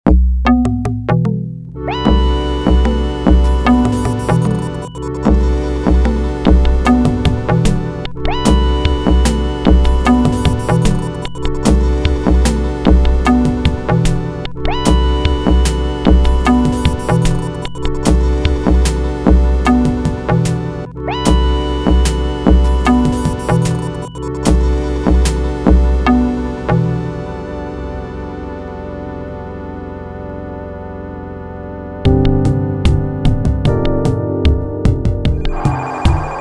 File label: FM Sound Box; Multiton Bits